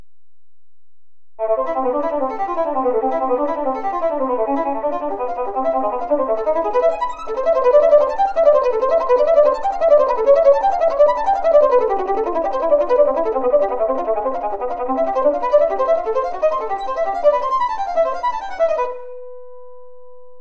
Tremolo varying

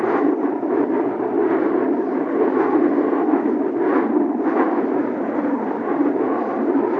wind

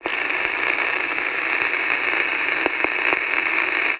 static

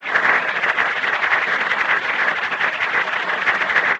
applause

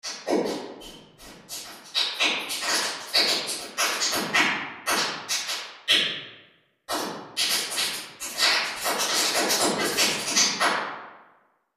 Large room bright, reverbs; .mp3 file - wet = dry - 26.7dB*; freeverb

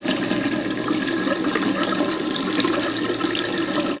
sink

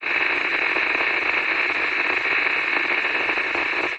static

